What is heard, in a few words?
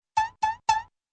shoulder tapping doinks